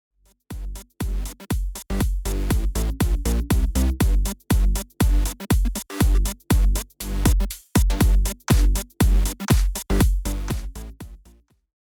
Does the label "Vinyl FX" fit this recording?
No